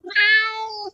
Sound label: meow A3